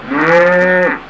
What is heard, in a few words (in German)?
muh